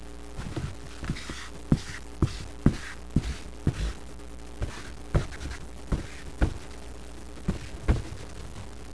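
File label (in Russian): черчение по доске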